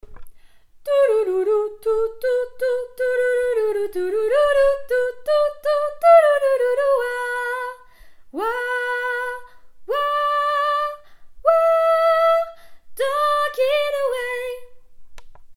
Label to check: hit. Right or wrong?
Wrong